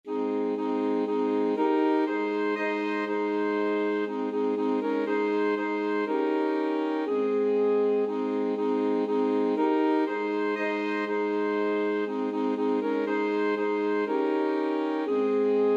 alto sax quartet

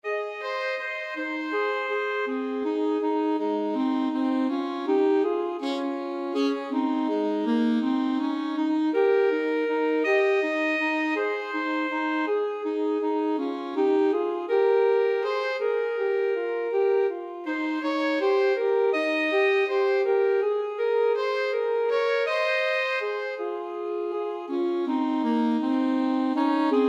Alto Saxophone duet

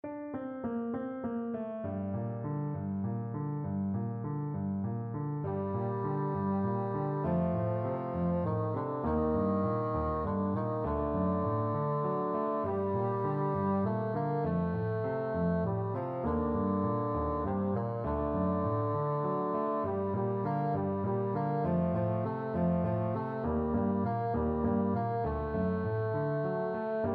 spanish violin, bassoon

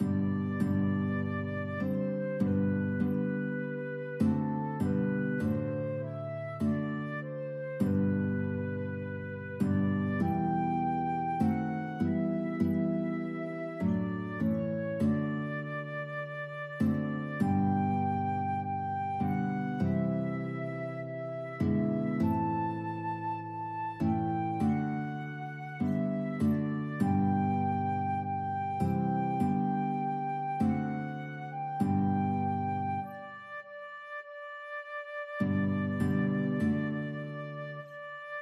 it is well, flute guitar duet

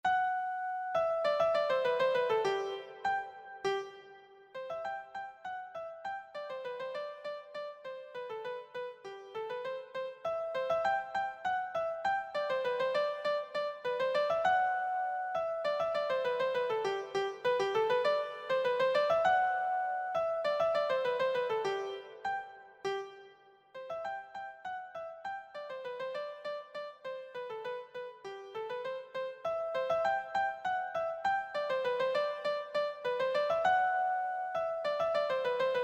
kipera, keyboard

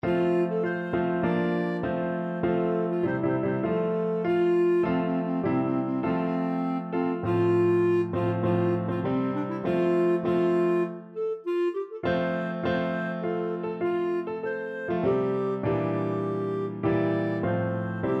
chinese anthem, clarinet

